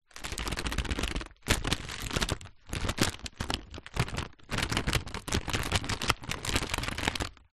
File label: packet shake frozen veg two bits remaining; foley